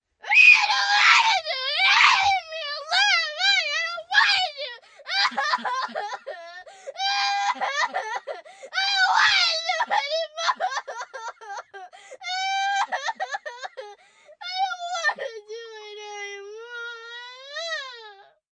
Tantrum Screams, Temper tantrum, 1 girl, 8 yrs., screaming, yelling, crying, whining, sobbing; angry